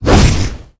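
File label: wind draco attack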